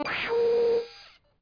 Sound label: sfx map zoom out